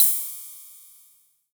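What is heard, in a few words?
cymbal, Vermona DRM2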